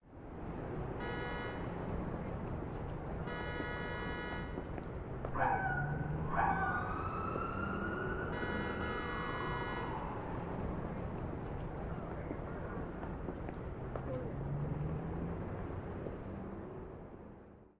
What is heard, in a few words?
City Ambience; antonblast dynamite demo